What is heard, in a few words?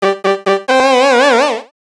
castle horn